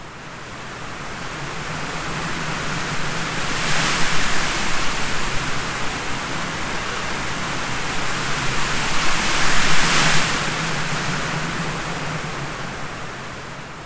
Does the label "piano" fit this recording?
No